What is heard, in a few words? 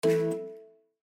battery low